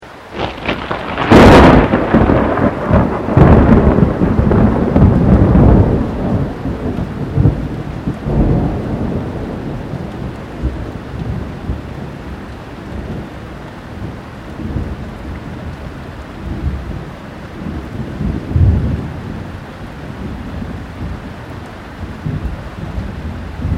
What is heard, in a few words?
THUNDER CLOSE LOUD RAIN; Elemental weather effects